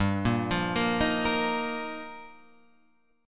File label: G Minor 7th Sharp 5th, Guitar, Open F; Gm7#5; arpeggiated; JGuitar